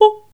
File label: G#4, Voice Spectral